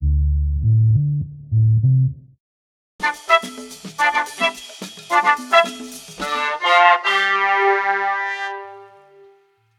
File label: horns, phonky loops